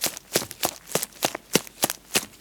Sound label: Running Leafy Area Loop